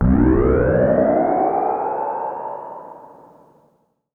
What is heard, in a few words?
Simple OSC Spring Reverb